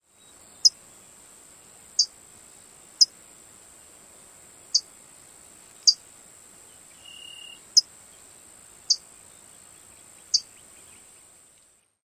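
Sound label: chink calls, hooded warbler; TX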